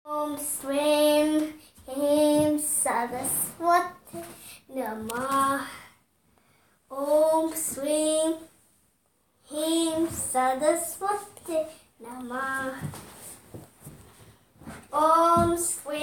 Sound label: Om Shreem Hreem Saraswatyai Namah Mantra Sung